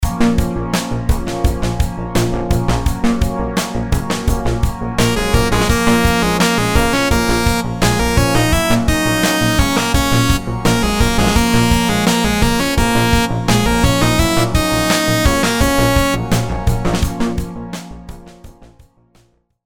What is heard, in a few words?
rhythms simple dotted 8s 85